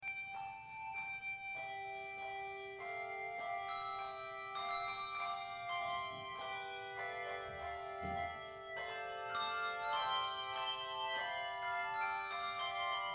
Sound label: Bells, bell choir